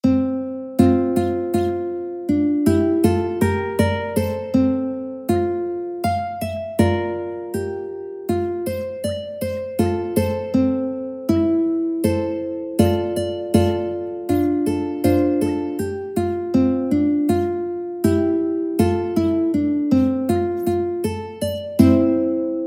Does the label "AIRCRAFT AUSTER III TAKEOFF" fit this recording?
No